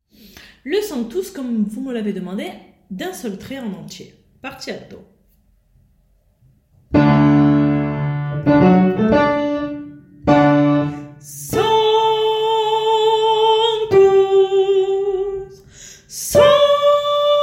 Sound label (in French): partie alto en entier; Sanctus